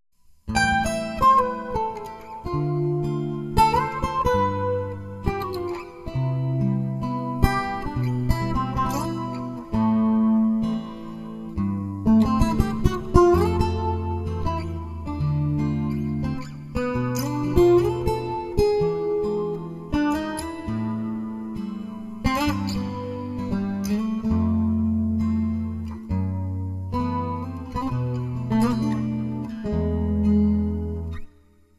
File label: Ballad Riff